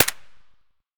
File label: akm silencer distant, weapons; ak103; STALKER; IWP